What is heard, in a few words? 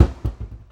Pop chuff bounce